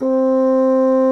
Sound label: BASSOON C4, REEDS; Greytsounds VOL-1